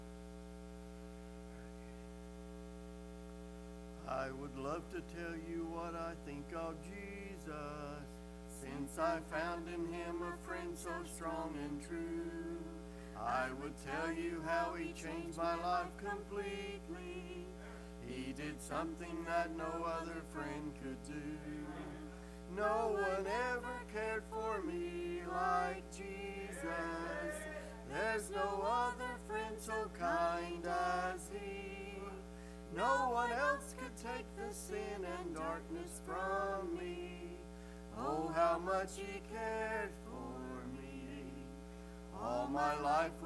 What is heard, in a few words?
singing Family